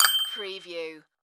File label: Multimedia Bell